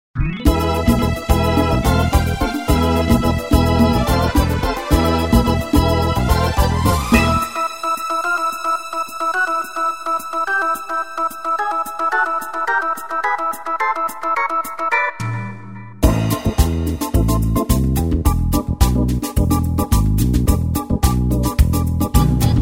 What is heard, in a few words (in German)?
Orgelsamba